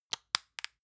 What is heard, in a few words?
lotion lid close medium; friseur; berufe